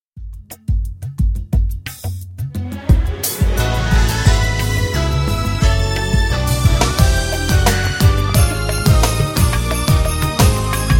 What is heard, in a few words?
hallelujah chorus